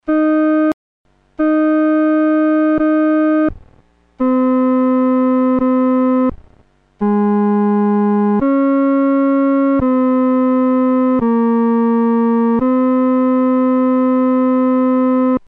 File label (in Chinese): 独奏 第三声; 阿门颂（二）